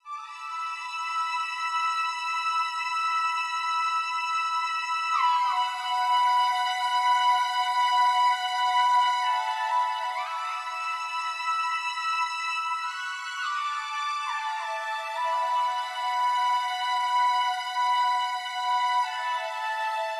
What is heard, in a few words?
string pad A, Smooth RnB, Emin